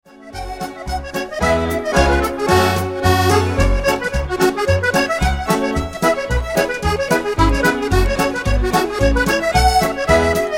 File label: Hornpipe